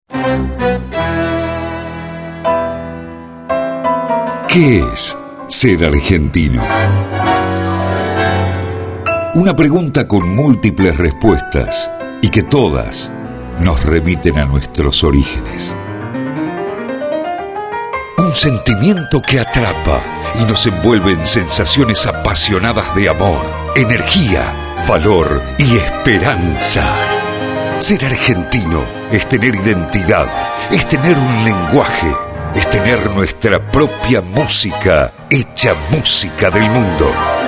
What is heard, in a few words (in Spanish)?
promo tango